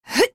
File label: effort dash; female billy vo rework tifa ff7 remake